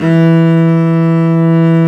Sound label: cello, Arco Solo; String Master Series